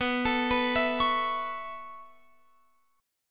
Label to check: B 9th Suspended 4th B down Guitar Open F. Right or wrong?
Right